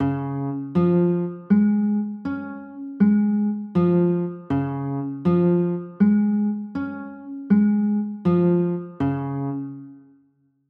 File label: d flat maj, chords